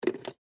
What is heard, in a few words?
Monophone Up, Technology, Telephone, Monophone, Receiver, Pick, Up, Antique; BlastWave